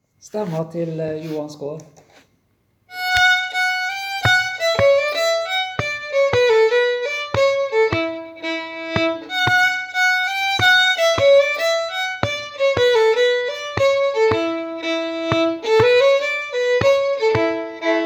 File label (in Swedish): Polska, Svanskog, 2a-stamma; Polska efter Johan Skar